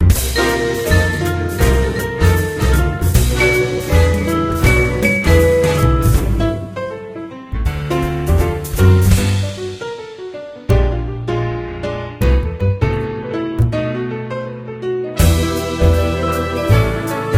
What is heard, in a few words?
Main Menu Music; Hotel Dash Suite Success